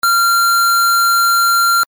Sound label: Square 1380